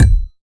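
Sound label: Kick one shot; Sonic Foundry (Sony Creative Software); Club Joints and Street Anthems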